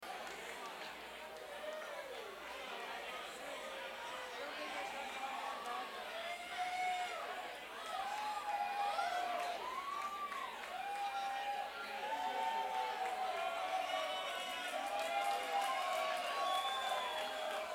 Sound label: Applause